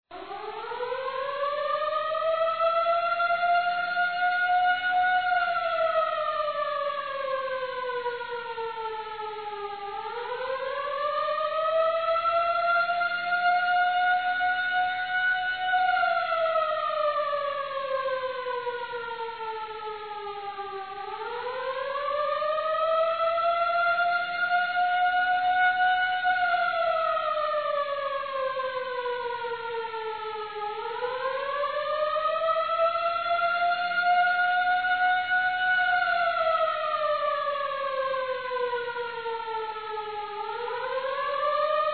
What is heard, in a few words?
alarm